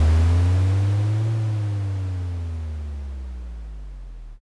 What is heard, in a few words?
dragon